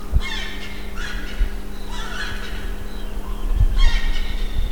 Aramides ypecaha

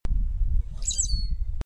Molothrus rufoaxillaris

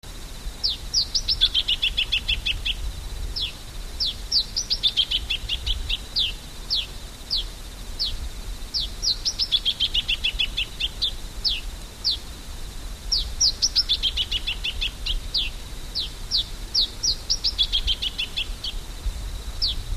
Geothlypis aequinoctialis

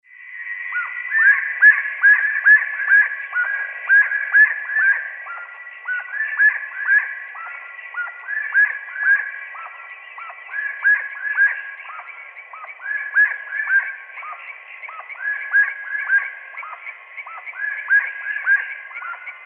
Aramides saracura

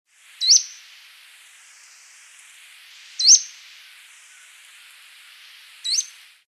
Xenops minutus call; Itabo

